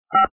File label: DTMF 3